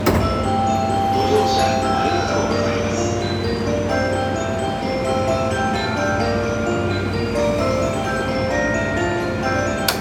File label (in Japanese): 京浜東北線高輪ゲートウェイ南, train sounds